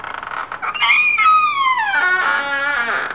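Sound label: door creak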